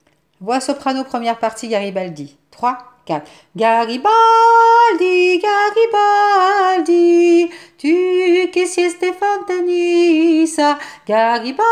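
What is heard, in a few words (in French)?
1ere partie soprano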